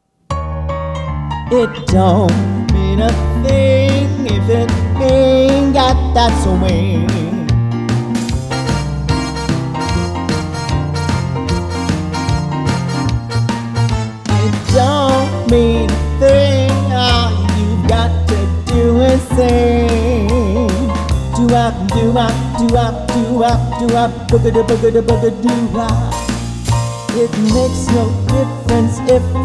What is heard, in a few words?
swing